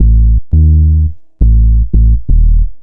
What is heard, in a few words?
Bass, Futurist Drum & Bass